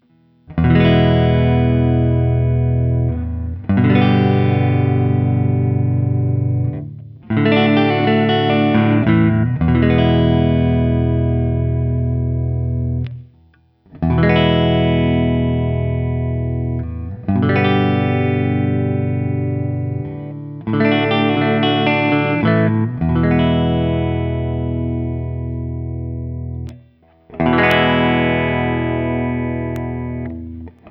X170T, TV-Jones Setzers, Open Chords; Guild; ODS-100; guitar; Guild X170T Mars from 1999

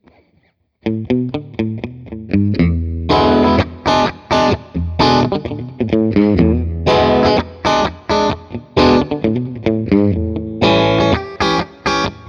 3D Printed Strat 59 Bassman, guitar

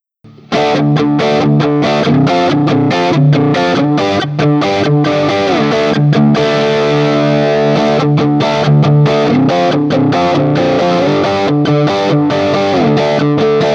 Guild X92 Citron, D-Shape Chords; Guild X92 Citron Breakaway from the depths of 1984; JCM-800

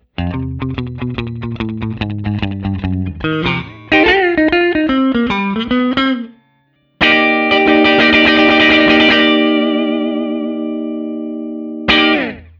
Guild X175 Rockabilly; Guild Newark St X175 Manhattan Special

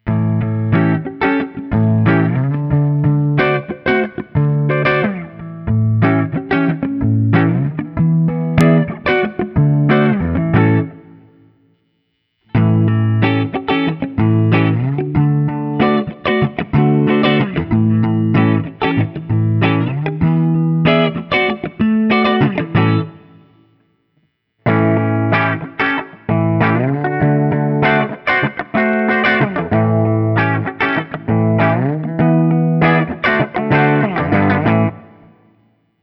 Burnside Lance ODS100

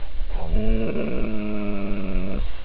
Ear Noise

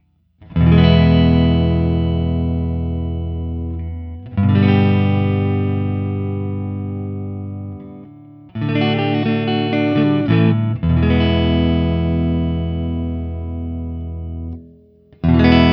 Guild NS Starfire I SC; Guild Newark St Starfire SC

